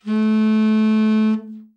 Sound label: TEN SFT, TENOR SOFT, Sax; Giga Samples Collection